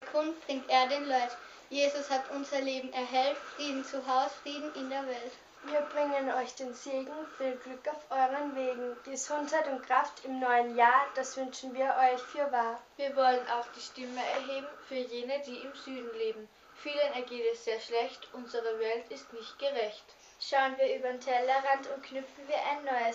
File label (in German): Sternsinger; Kirchschlag; die jungen gehen fur die anderen